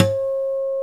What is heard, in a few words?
GTR 6-STR, Steel String; Roland LCDP02 Guitar and Bass